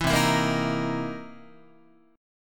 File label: C 7th Sharp 9th C Guitar; strummed; JGuitar